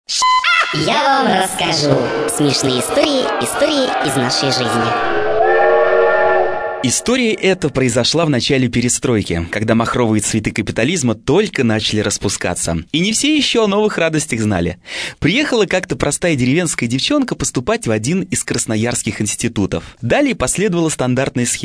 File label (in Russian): smeh